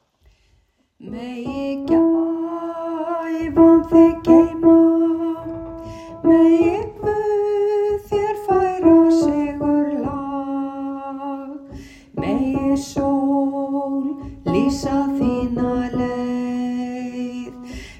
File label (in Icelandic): Megi gaefan thig geyma alt